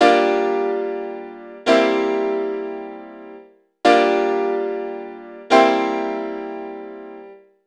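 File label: piano, Disco; Best Service ProSamples vol.48 - Disco Fever; AKAI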